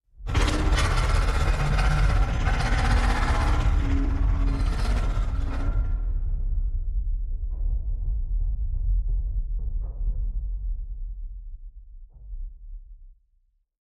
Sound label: metal beast; soundscrate